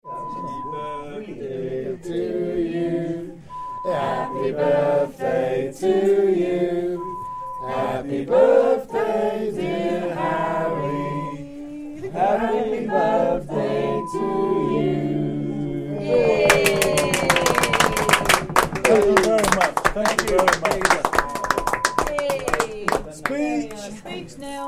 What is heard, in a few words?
birthday sounds